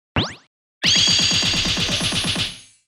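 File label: attack; hero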